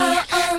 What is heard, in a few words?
"uh uh" female SUNG, Voice